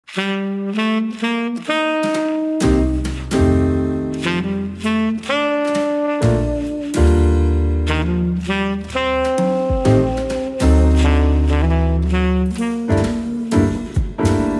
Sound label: piano jazz